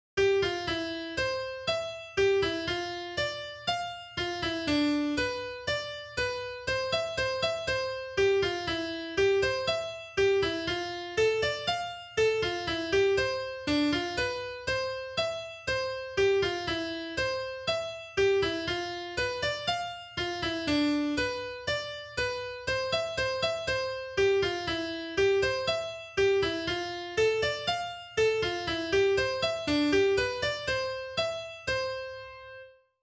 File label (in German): Glockenspiel; Mozart